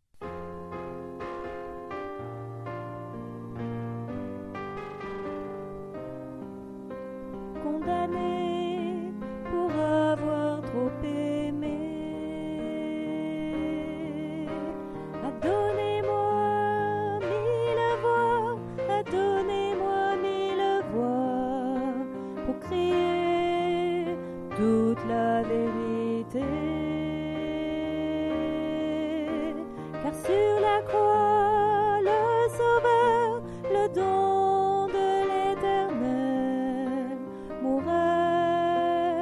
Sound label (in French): BASSE